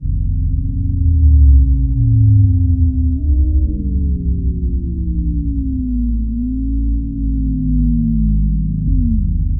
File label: Fireworks Ghostly, Ethereal Textures; Sonic Foundry (Sony Creative Software); Ma Ja Le; Soundworlds